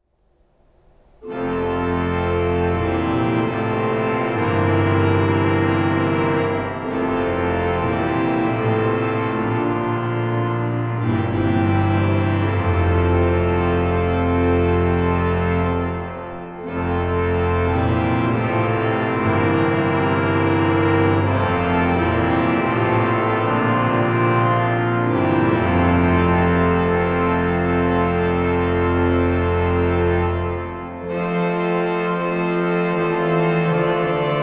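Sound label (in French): organ, cathédrale; Sion; valais; Jean Sibellius; Finlandia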